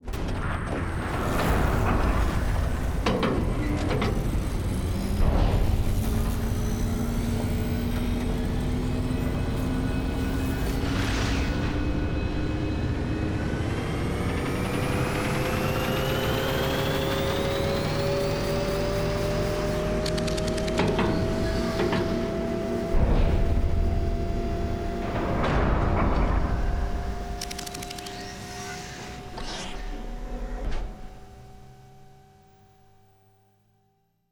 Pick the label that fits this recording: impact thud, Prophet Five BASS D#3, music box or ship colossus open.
ship colossus open